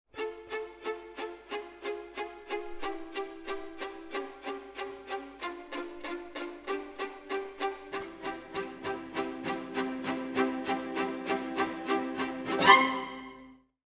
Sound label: Orchestra, Jazz for piano